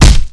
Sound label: BEATTACK